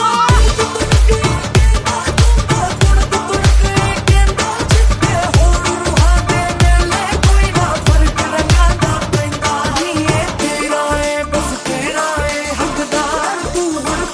new punjabi song ringtone

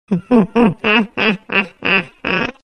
short funny laugh; galaxy ringtones

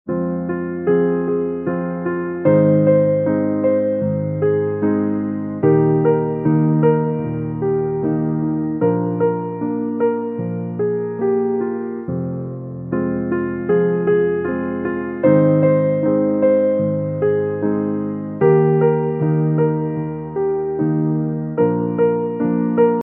piano ringtone

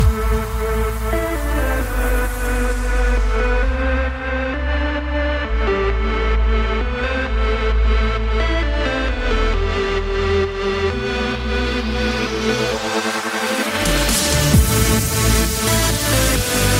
new english music ringtone

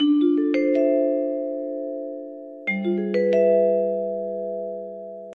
xylophone loop relaxing music call tone

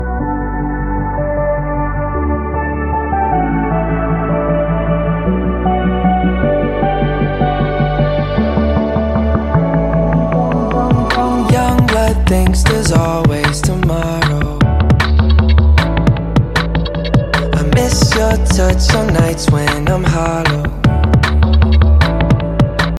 ringtone english ringtones

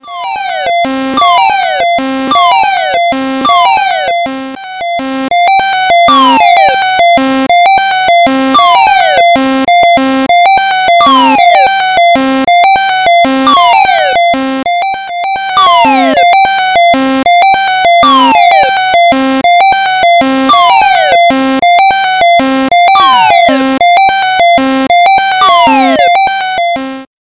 clock alert ringtone; new htc

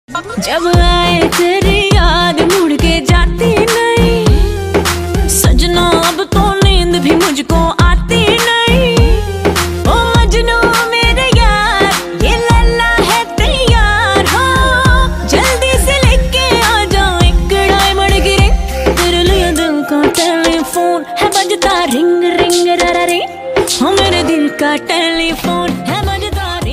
hindi romantic ringtone, bollywood

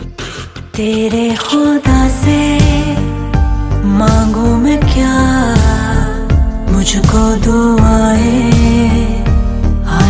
ringtone bollywood ringtones

